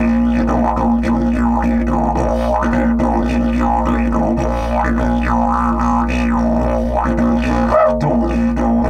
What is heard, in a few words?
108, Didgeridoo